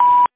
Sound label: beep; WINFAX